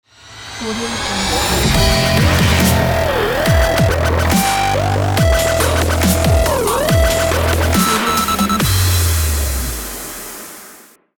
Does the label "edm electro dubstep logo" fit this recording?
Yes